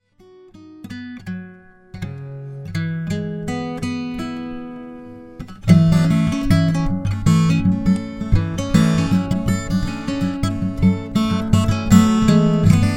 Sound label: guitar ditty